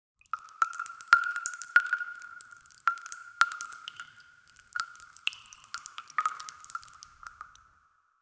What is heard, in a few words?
thick liquid dripping, blood